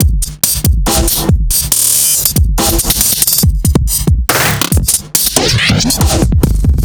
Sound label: drum